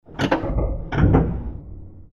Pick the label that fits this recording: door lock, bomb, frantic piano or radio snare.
door lock